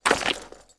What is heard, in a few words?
male mud, footstep